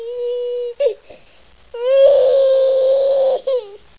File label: crying, sad baby boy